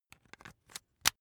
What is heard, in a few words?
razor rickety fold up